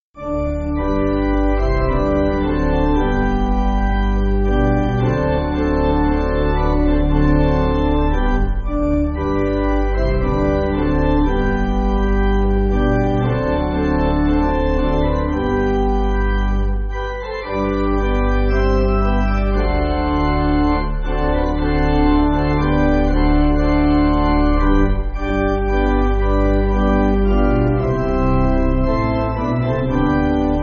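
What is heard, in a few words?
Pipe Organ, church music; Your People Implores; Bost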